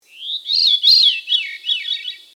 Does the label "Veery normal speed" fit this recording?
Yes